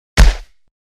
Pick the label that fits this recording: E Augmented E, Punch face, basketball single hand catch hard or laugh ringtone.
Punch face